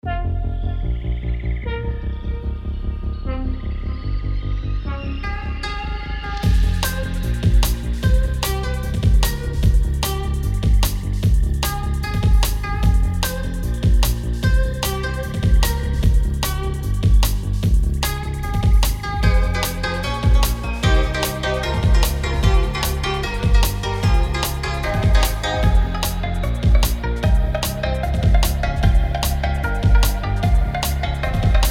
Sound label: Forest; Forest 02 - Level 1-4; Inukari Chase of Deception; xbox series xs